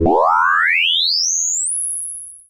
Ring mod FX

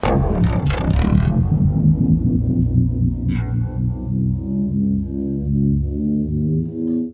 REV, Guitar; East West; Steve Stevens Guitar Samples Collection

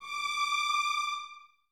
Violins Detache D 6; Miroslav Vitous; String Ensembles

